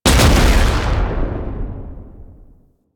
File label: Underground Attack Impact; Enemies; Abilities; Warframe; Proto Infested; Babau